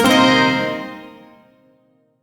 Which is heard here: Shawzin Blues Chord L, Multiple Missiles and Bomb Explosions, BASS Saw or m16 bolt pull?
Shawzin Blues Chord L